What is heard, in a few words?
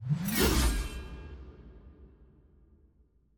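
guillotine whoosh; ambience room torture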